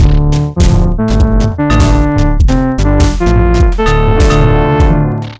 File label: mission win; Desert Storm WMD Sweeper